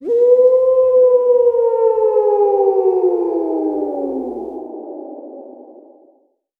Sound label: ghost howl; jibo